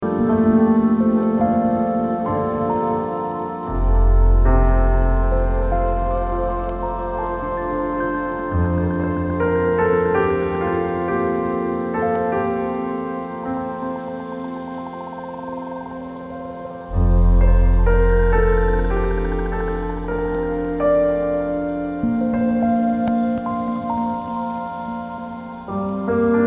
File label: alto